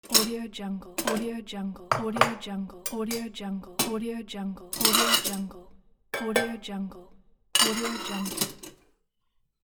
kitchen pack

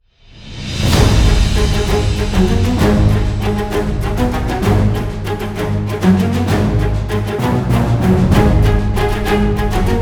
pirates

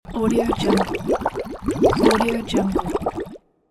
boiling potion; crystal note

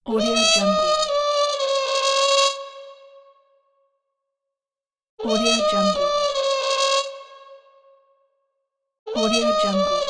trumpet fail

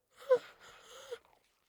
baby camel; prosoundfx

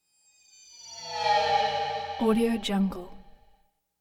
mystic tone swelling synth bursts shimmering

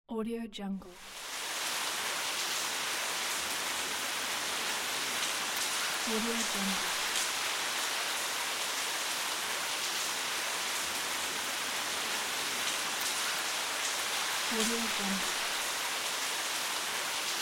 rain

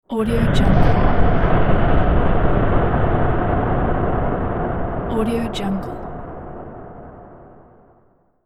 impact meteor

